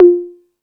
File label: Beat Box; E-MU Pro-Cussion